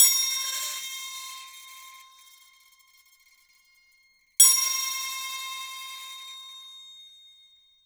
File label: crotales with foil